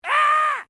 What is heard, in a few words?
MALE SCREAM MID